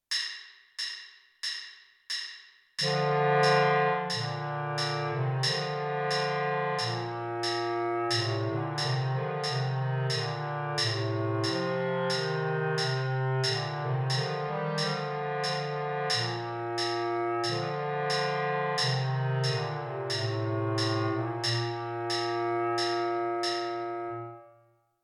bass clarinet

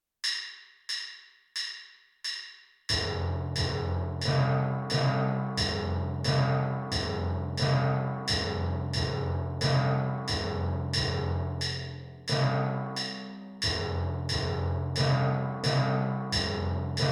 major scale timpani

